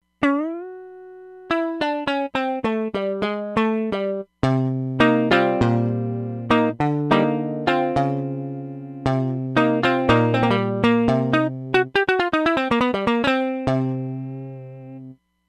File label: Crimson Gtr, Roland Fantom XR